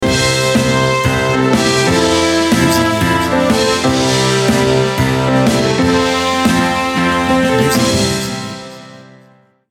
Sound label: comedy jingle